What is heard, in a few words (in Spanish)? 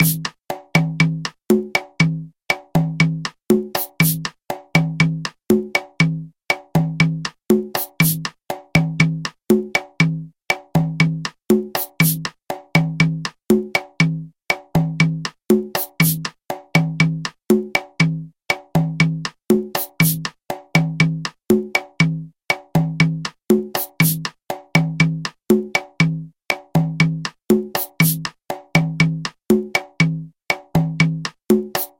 yambu matanzas